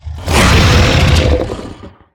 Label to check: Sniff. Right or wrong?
Right